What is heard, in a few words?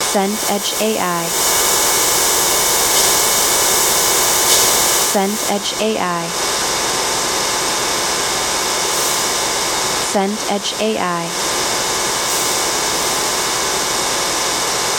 ambience cafe